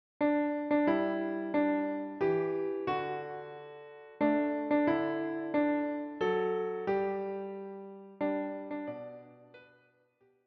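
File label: piano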